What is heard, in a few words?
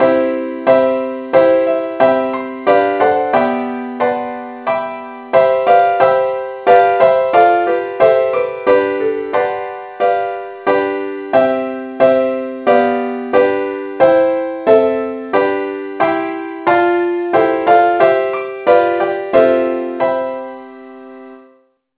key c; saintthomas